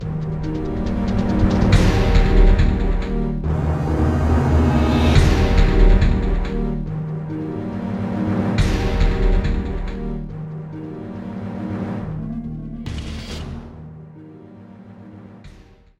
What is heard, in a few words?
BGM Combat; District 5